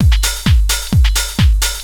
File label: dr t 130 trance 2